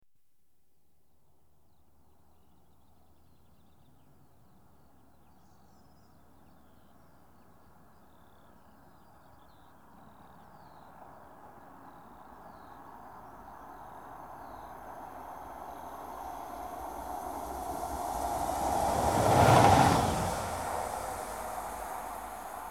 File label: Trains Passing Single diesel; The BBC Sound Effects Library